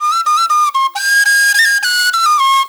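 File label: PENNY WHISTLE; Spectrasonics; Heart of Africa 2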